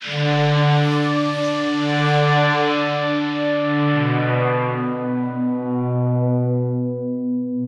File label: 125bpm String Intro